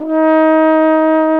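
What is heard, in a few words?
tenorhorn E flat; BRASS; Best Service; Alpine Volksmusik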